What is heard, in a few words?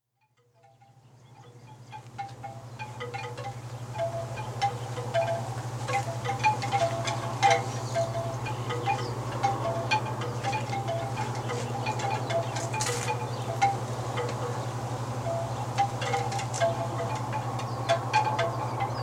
Quiet Night